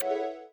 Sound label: elecsound